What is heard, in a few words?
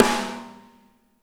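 snare left; Spectrasonics; Burning Grooves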